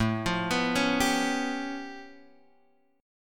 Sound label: A Augmented 9th A Guitar Open G